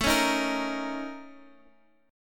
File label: A sharp Minor Major 7th Double Flat 5th